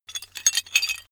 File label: metal handling pieces